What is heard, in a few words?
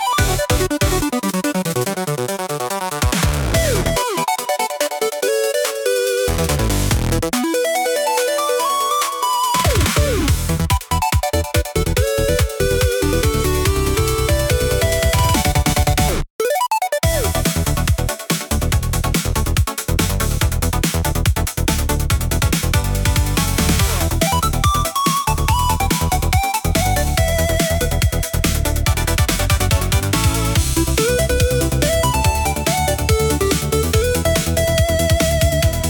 rpg well cavern; tillys-quest